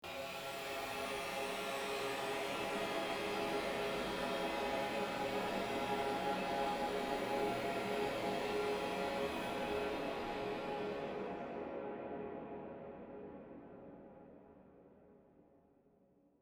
Stem 6, version « Mix », avant égalisation; favoriser les côtés aux dépends du centre par une égalisation différente à gauche et à droite

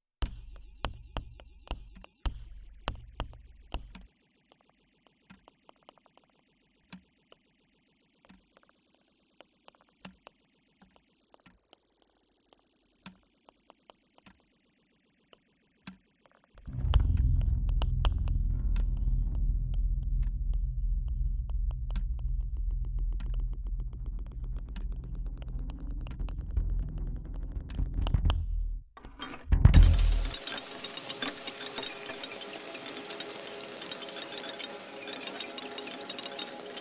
Tous les stems de la version « Mix », après traitement et réajustement des niveaux

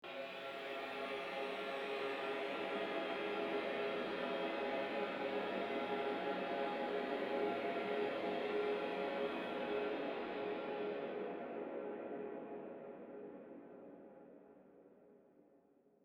Stem 6, version « Mix », après égalisation stéréo

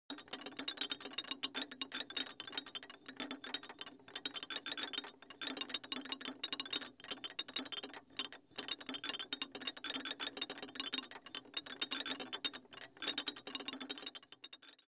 Application de l’égalisation sur le stem 8, version « Mix »

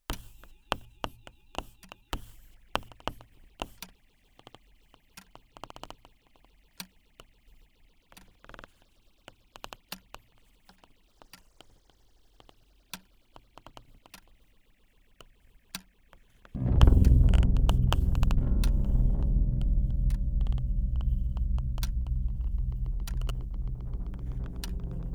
Tous les stems de la version « Source », avant traitements individuels; Tous les stems de la version « Source », avant traitements individuels mais avec

